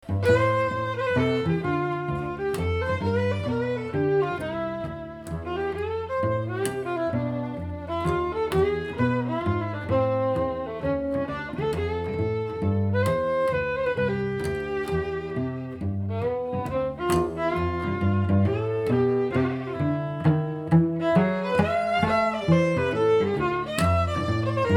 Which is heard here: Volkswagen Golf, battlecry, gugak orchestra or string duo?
string duo